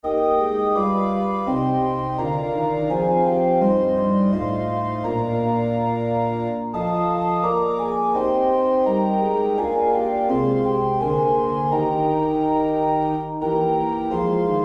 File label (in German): Herzlich lieb hab ich dich, o Herr, organ